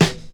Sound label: short snare E key